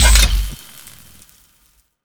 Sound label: sec door open